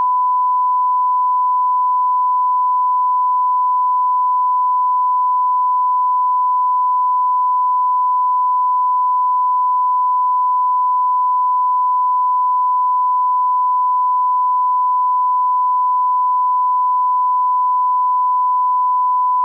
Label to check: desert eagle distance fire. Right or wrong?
Wrong